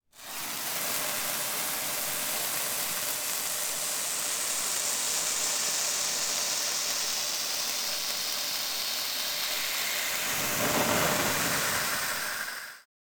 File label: Fireworks firecrackers fountain sizzle and crackle